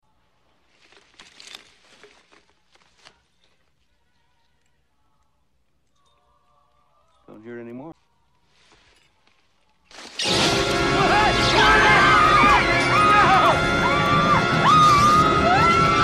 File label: squirrel